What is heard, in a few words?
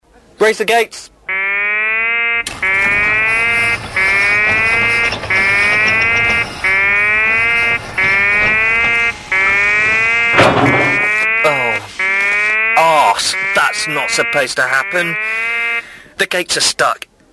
Zombie run lyd